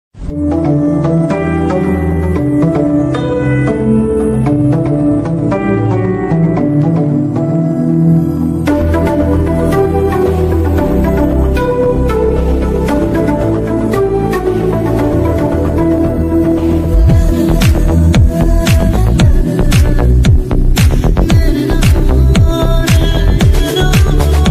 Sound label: jesus ringtone new bgm jesus telugu ringtone; christ